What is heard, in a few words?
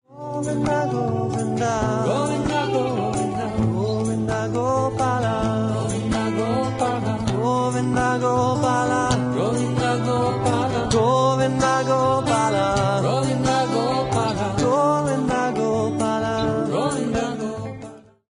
Govinda Gopal, Guitar